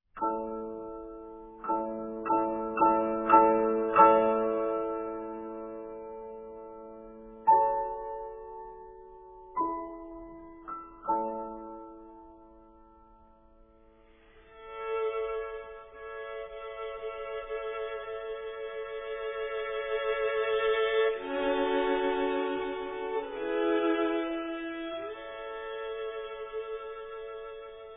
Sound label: Piano Trio; Antonin Dvorak; Dumky Op 90